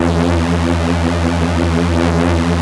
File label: Korg PS3100 E 3; Back In Time Records; Korg Universe VOL-1